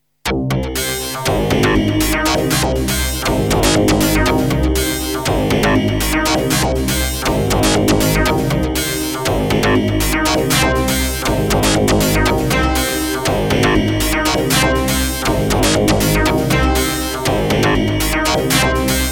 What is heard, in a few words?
tx802 pluk